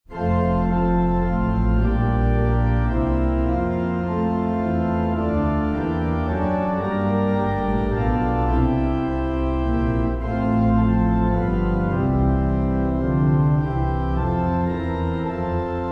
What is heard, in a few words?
Italian Hymn; Selah Recordings